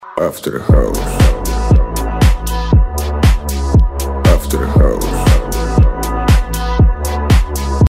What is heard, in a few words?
after house